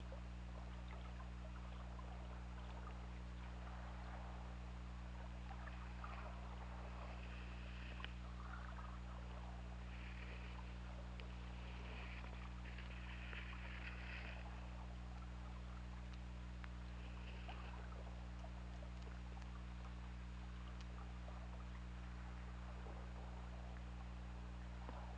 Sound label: Orca; OrcaLab